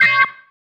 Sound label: Stab, Organ, One Shots; Sonic Foundry (Sony Creative Software); Joe Vitale Organ Donor